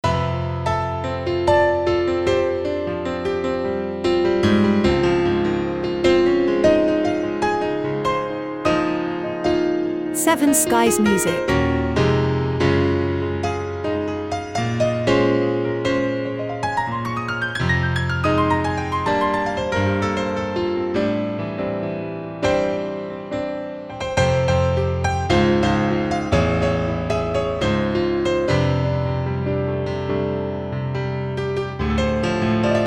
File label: Jazz Piano improvisation